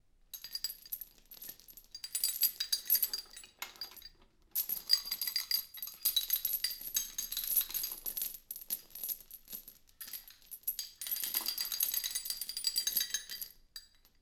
chain movement; DeadFright

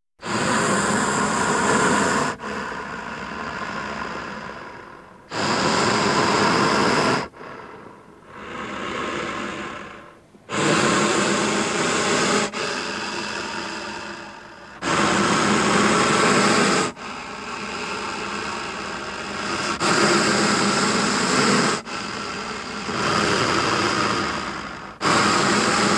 Dragon; 1001 Sound Effects; Halloween Horror Sounds Effects

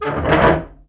chair move; DeadFright

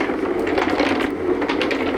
Roll Dolly Linoleum Loop; SFX Kit; Foley - Misc

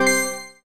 PUZZLE Success Keys Two Note Fast Wet; stereo; Universal Sound FX

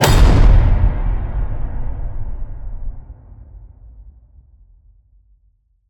HIT Massive, Cinematic Hits Designed

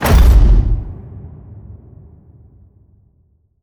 HIT Metal Crash, Cinematic Hits Designed

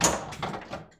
Pinball Flipper Hit Ball

